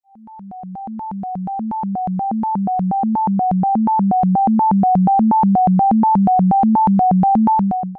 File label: cambiata illusion fade Left; This is a stereo sound file